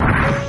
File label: robo step sound